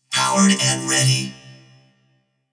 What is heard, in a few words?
power up; matchup cyber; Cyber Quincy (Battles 2)